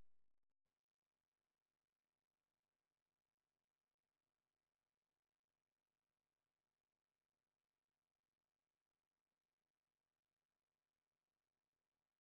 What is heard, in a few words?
92 BPM D Min Lead Texture; Melody; Loop Stems; Cymatics; 2022 Melody Collection Platinum Expansion - Pt 2; Top Floor